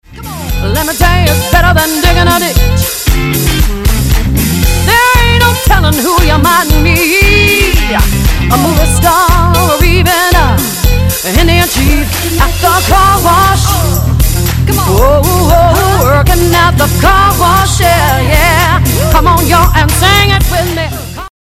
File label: 70s, dance-band